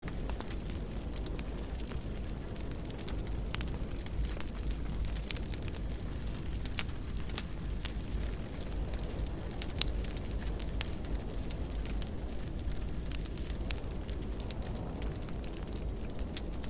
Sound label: campfire night sounds